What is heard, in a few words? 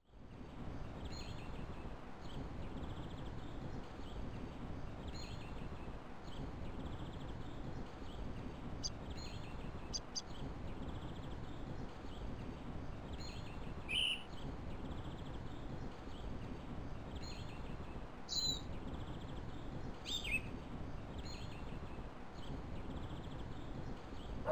ambience neighbourhood; pippi longstocking 3d nintendo 3ds gamerip